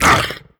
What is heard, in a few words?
grunt hard, creatures; vox